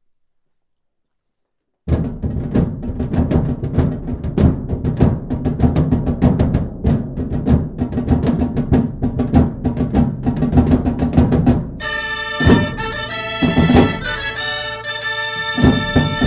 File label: Fanfarria